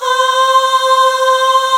POP AHHS

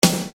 Snare Dr

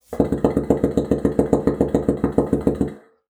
BASSOON; Xsample VOL-6

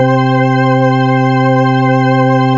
BRIGHT ORGAN; Zero-G; Funky Elements

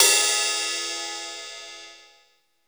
KOMMERZDRUMS

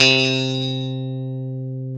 guitar, e-guitars

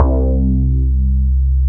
JUNO, Synth Bass, Analog Bass

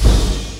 BD, Industrial; BD KIT; Industrial Loops

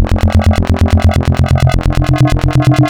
Spectral, wavetables; Architecture 32

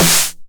909 SD Tape Sat

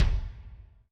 Lo Dbl H Rm, Alesis SR16

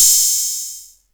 Long 808 Hat; Drum Hits; Nu Dance Electro

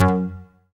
K-2000, Synth Bass; BA1 Synth-Basses; Sound & Vision - Gigapack I CD 2

